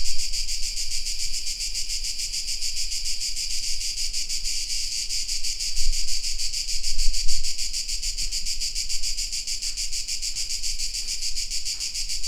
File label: crickets